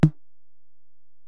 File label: Tom, Alesis DM-PRO, Drum Machines, Toms